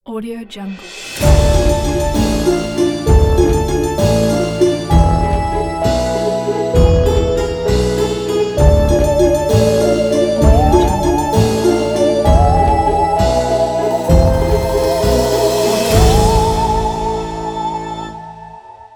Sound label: halloween logo pack